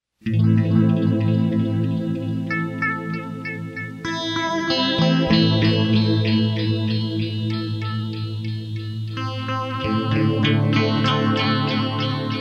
hallow strum

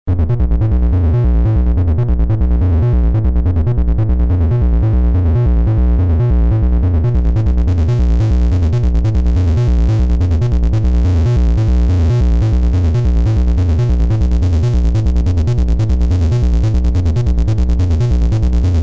analog synthesizers sequencers; dr zee workshop

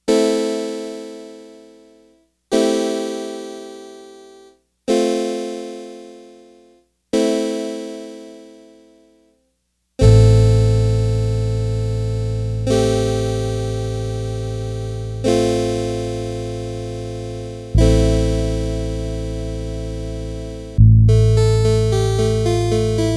univox cp 110 electric piano; dr zee workshop

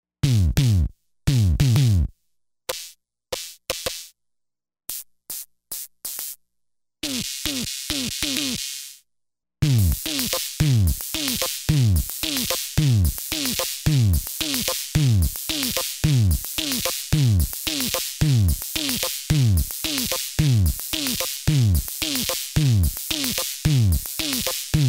raw beat quad analog drum synthesizer; dr zee workshop

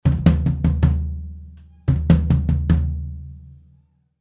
pearl forum floor tom 16

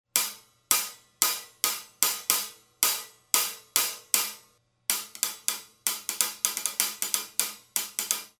pearl cx300 hihats 14

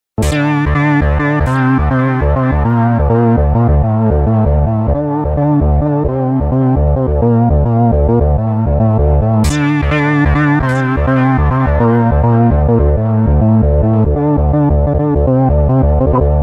synbassriff

